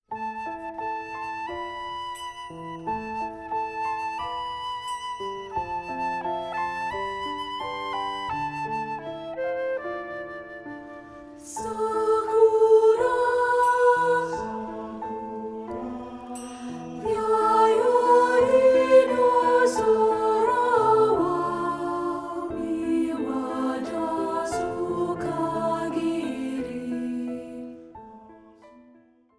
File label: SATB (Alto Dominant); Sakura